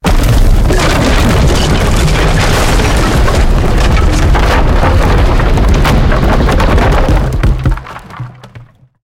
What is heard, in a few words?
wood building fall big; tanks; War Thunder